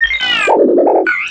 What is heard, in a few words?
robot damage; Earth and Beyond; EA Games